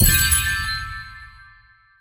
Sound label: magic hit